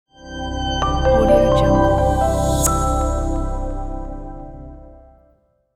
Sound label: inspiring opening logo